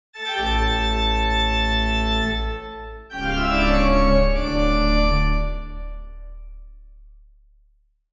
organ instrument

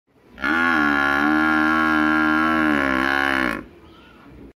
Domestic water buffalo

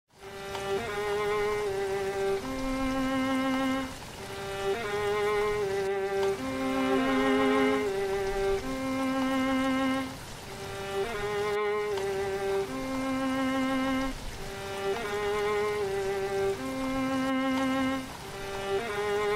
Rain and violin

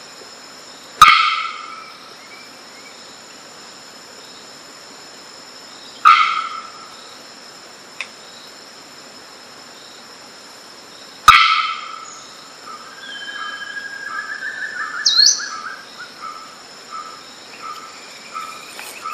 bearded bellbird

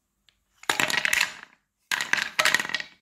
Wooden containers; ظروف چوبی

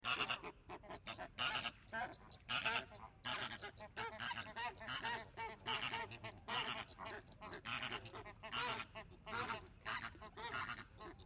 ambience park zoo flamingos

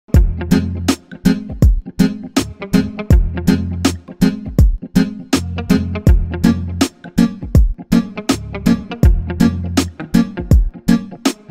hip hop; موسیقی هیپ هاپ بی کلام

